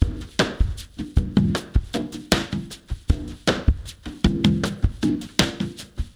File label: ICE BRUSH REMIX, Liquid Grooves